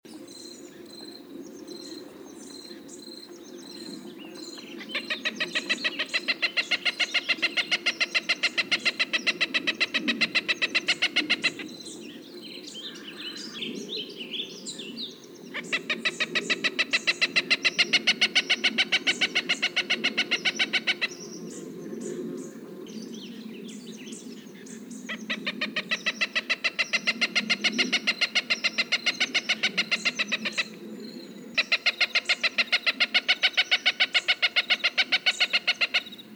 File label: Cooper's Hawk Sound